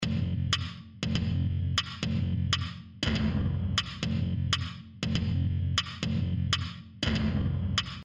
Weird Lab Loop

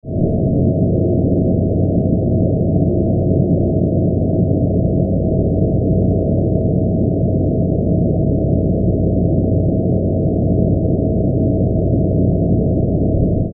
Ambient Rumble

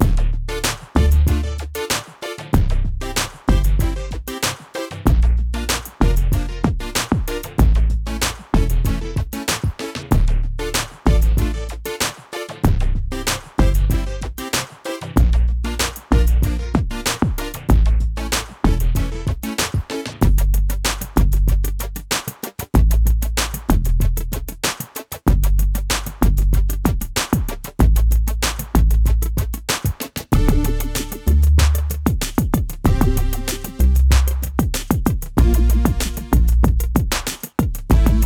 Coin Op Low Rider, chiptunes

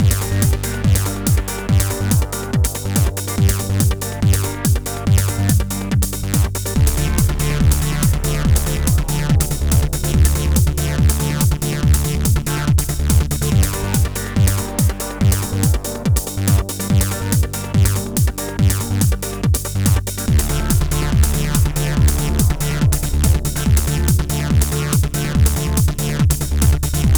8 Bit Mayhem, looping music

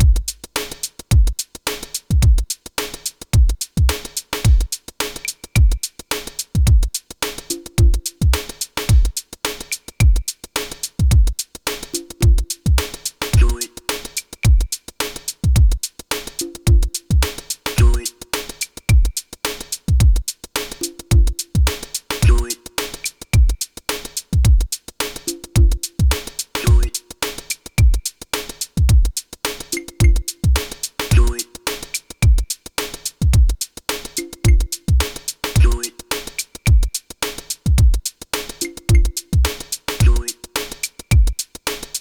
Looping; funny quirky

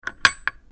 Drawer Latch

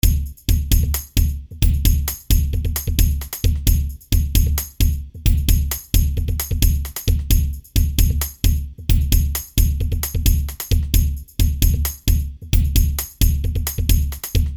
Action Rhythm

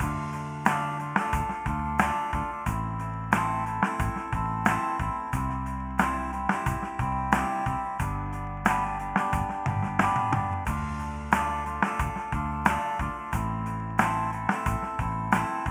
Acoustic Rock Drama LoFi, rock

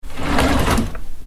Kitchen Drawer Open with Silverware

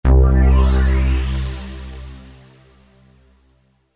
Power Up

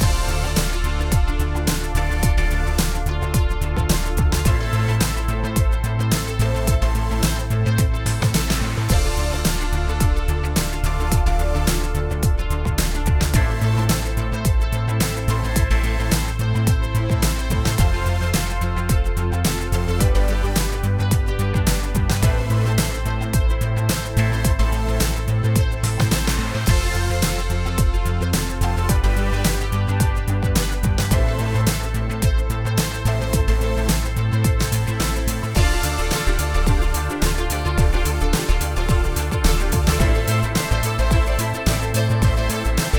Robot Evolution Looping, sci-fi

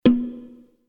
UI Quirky

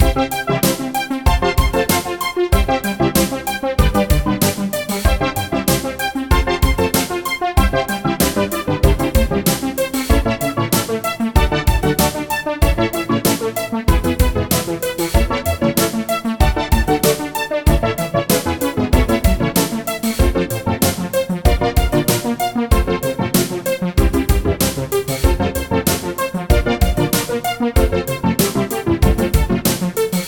Fun House, funny

